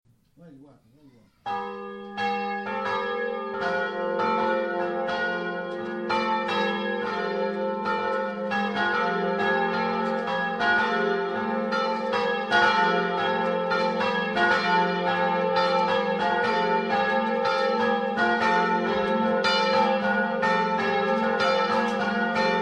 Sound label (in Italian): campane; FRAELACCO